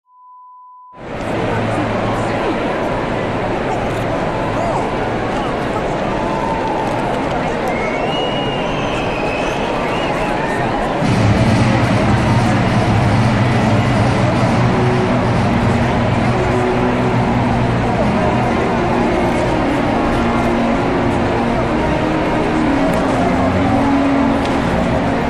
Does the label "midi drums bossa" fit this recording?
No